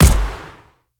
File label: rock hit